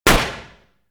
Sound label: colt shot